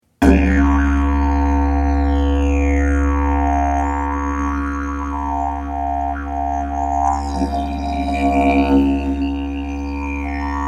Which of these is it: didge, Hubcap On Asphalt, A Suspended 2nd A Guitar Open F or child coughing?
didge